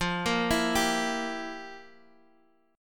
F Major 7th Suspended 2nd Suspended 4th, F, guitar